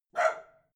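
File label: bark small, dogs; natur-tiere; hunde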